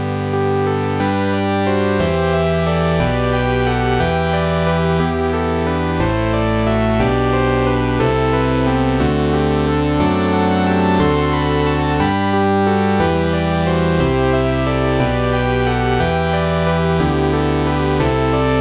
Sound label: fifth sawtooth wave